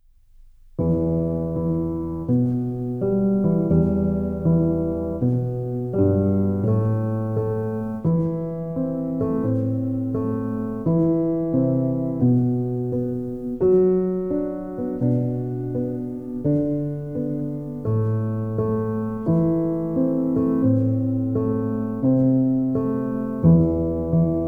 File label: Cooper's Hawk, Piano Preludes